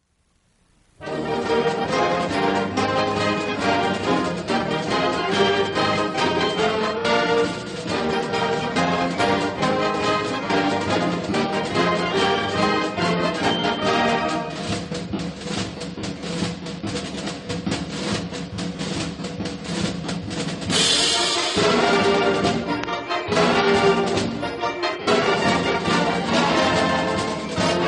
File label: Victory March